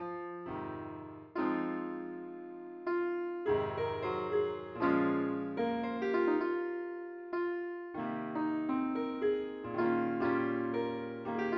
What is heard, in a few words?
piano